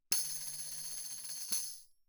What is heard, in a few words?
tambourine shake roll mf; Percussion